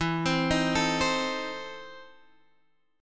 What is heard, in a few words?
E Major 7th Suspended 4th Sharp 5th E, Guitar, Drop D; chord shape